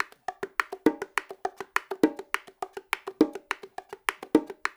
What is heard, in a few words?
LOOP, Percussion